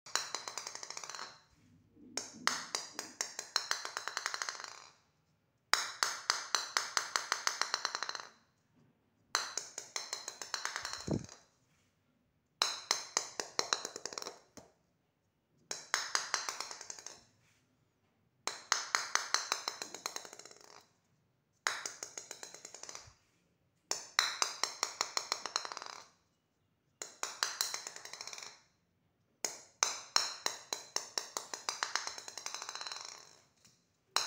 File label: plastic ball